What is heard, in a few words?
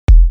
Kick 42Hz E; Sometimes